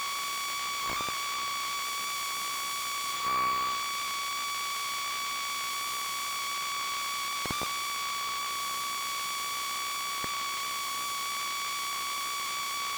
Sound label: Electromagnetic Keyboard Charger Loop Mono; Essentials Series NOX SOUND